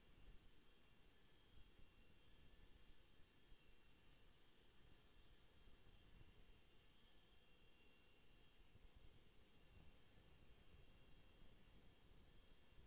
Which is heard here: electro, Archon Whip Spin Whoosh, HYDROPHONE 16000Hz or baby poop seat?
HYDROPHONE 16000Hz